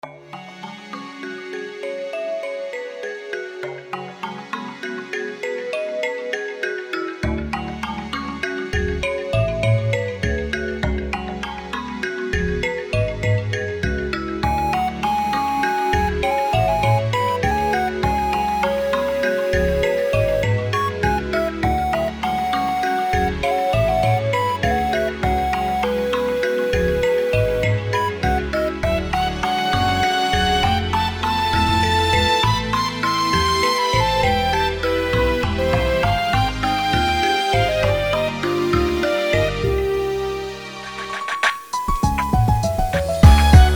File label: Thunderstorm